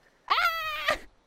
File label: Scream; IRyScream